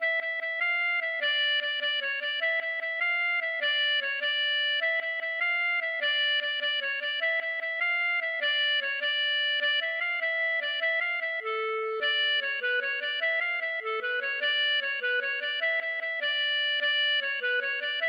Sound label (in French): riquenie; loudeac